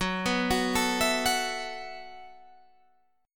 B 7th Suspended 4th F sharp

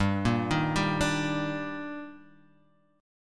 D sharp Major 7th G

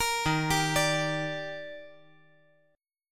Eb Major 7th; EbM7; arpeggiated; JGuitar

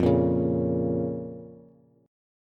F Suspended 4th Sharp 5th F, Bass

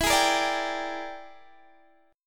E 7th Sharp 9th Flat 5th Guitar Open D, chord

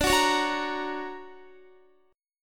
D Augmented Major 7th down Guitar; D+M7 strummed; JGuitar

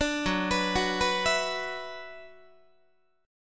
Gb 7th Suspended 4th Sharp 5th Gb Guitar; Gb7sus4#5 arpeggiated

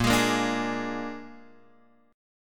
A sharp 7th A sharp, Guitar, Open G

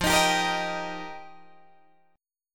F 9th Suspended 4th F Guitar Drop D, chord

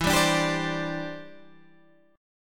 E Minor 7th Sharp 5th, down, Guitar, Standard; strummed

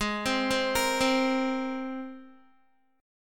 G sharp Major Add 9th G sharp, Guitar; G#add9 Chord

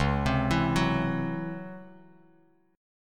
C sharp Minor Major 11th, Bass Standard; C#mM11 arpeggiated; 9,15,14,11; JGuitar

